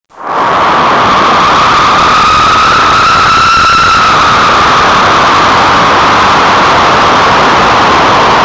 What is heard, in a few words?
hurricane; Sound Effects for Multimedia - Volume 1